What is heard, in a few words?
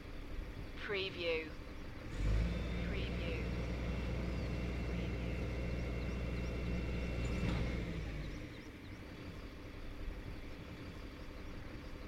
Vehicle hydraulic engine noise; beanstalk audio